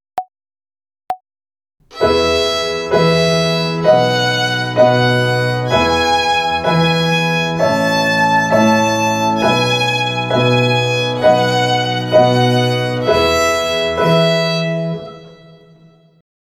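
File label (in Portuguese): Ginástica até o dedo 3 - Corda MI - Binária, Violino; Violino para os Pequeninos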